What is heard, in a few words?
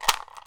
SM ELM R, PERC; AKAI S6000 CD-ROM - WorldSounds